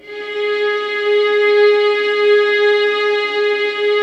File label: violin G#4, soft violins; Q Up Arts; Denny Jaeger Master Violin Library